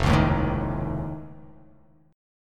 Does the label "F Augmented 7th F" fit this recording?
Yes